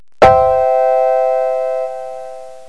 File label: PIANO